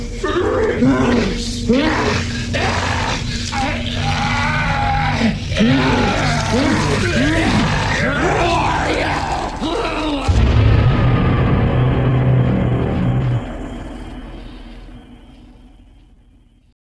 highway forest monster

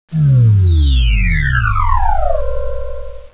void

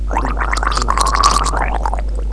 DROWN; potator

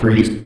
pain; vortex

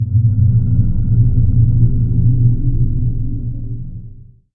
drone

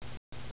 criket

